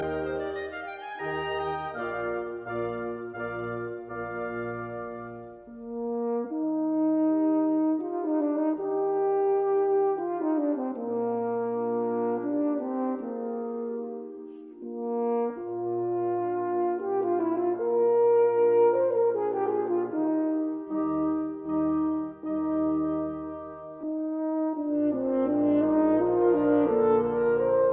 Concerto for 2 Horns in E flat major Adagio cadenza; cadenza by S Brubaker